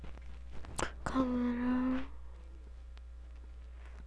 camera

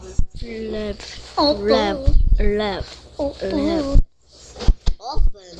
laugh

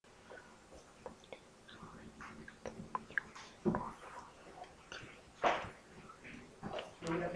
rip

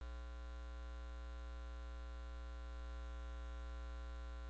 lid

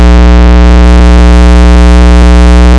cry

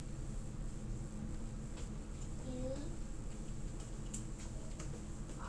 knife

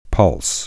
pulse

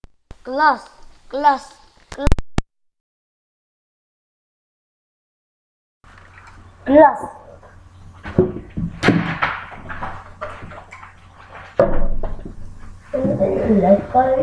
glass

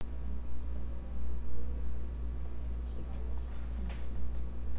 growl

